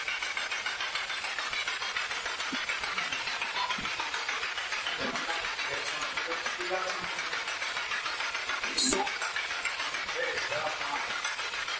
Spirit Box; Marys Room

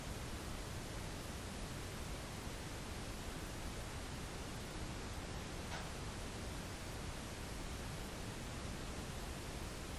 Tattoo Shop Static

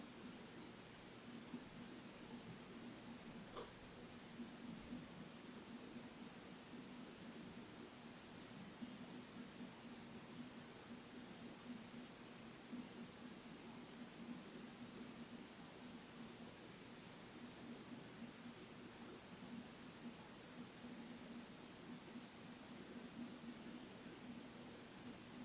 Inside Tattoo Shop

